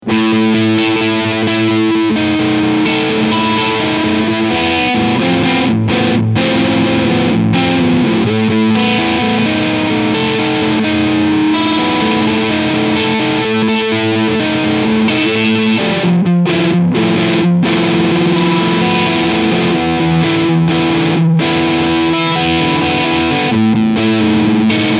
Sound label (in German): Framus Artist Line Crunch beide Pickups; Phil X G